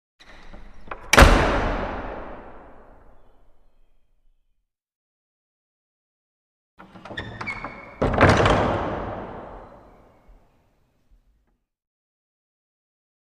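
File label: Large Wooden Door Close, crash slams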